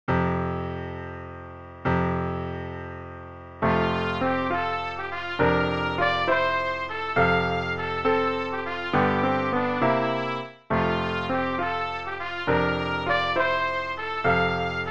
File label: trumpet